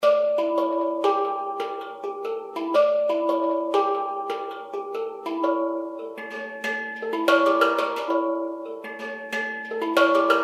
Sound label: Hang